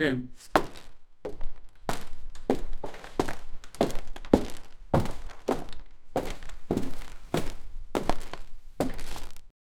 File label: ship